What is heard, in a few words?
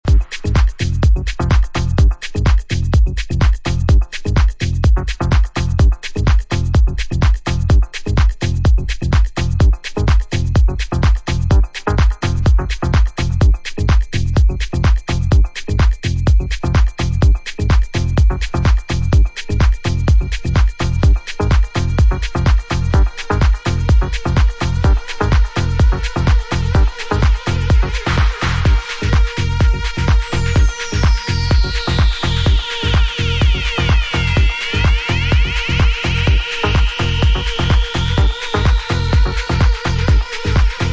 instrumental mix